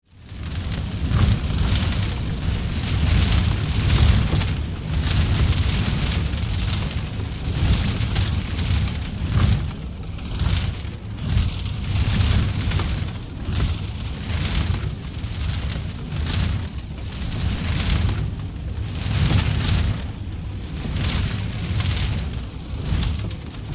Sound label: Magical Spell Fire, Horror, Magical, Spell, Fire, Whooshes; Magic Sound Effects; Magic (Sound Effects:Science Fiction); BlastWave